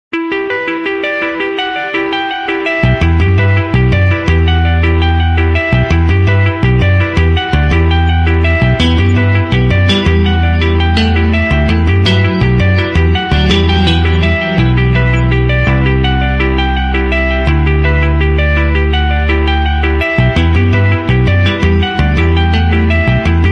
Nokia 6 Plus

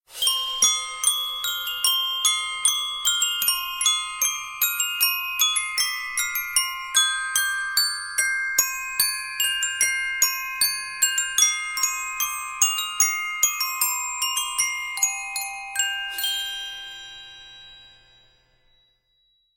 samsung galaxy s22

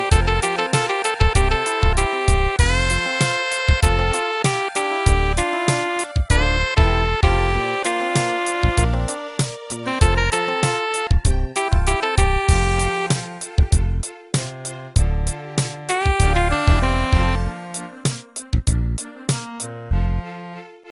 Nokia; suonerie

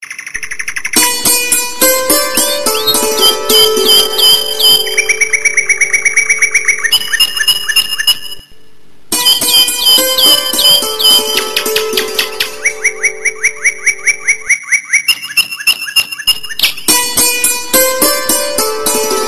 Nice Bird Music

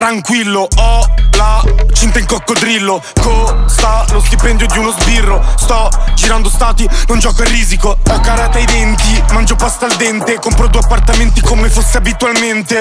wop wop; suonerie

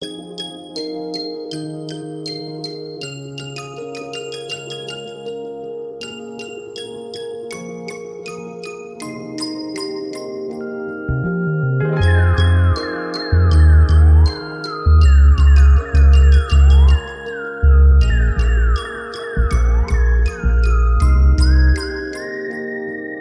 classique tune

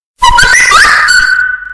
whatsapp earrape

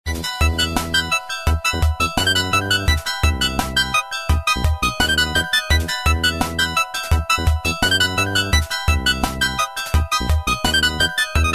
Huawei G2100; Suonerie Huawei G2100 per cellulari

